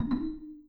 Low Battery; Vsmart Star 4; ui

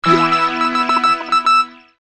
Mail Received, notifications; Coolpad; Rogue

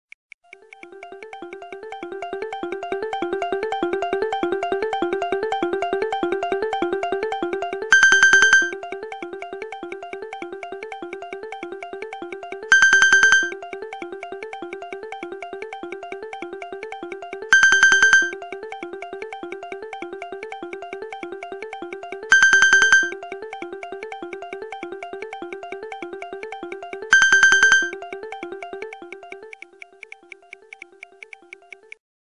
Clock Wake Up, Nokia Tones; Windows Phone apps

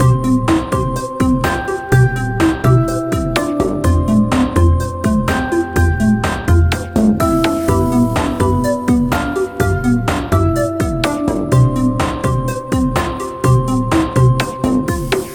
alarms; Meitu; V4

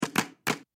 Clap, phonetones; Aio Wireless

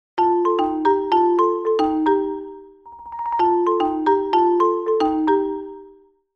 Marimba, ringtone; Tinno ATT Radiant Max

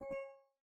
charged; BQ (Russia); 5007L-Iron

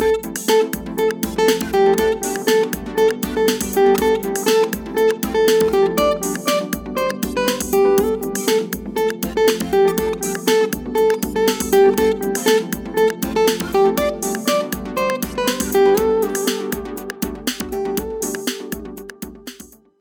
Happy Guitar; KONKA; ringtones; SP6

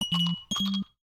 Ice Cubes, notifications; Samsung Galaxy S26 Ultra

